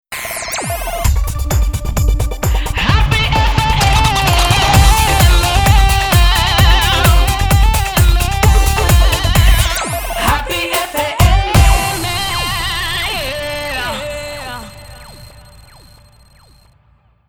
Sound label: happy